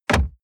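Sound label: sports car interior glove compartment close short; verkehr-transport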